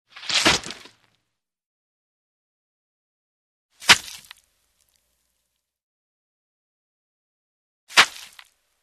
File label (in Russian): padenie so shlepkom